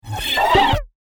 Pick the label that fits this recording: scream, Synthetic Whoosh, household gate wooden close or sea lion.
Synthetic Whoosh